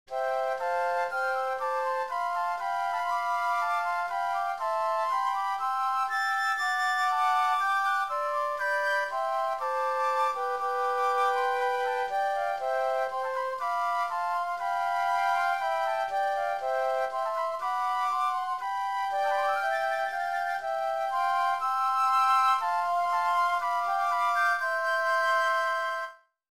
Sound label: Flutes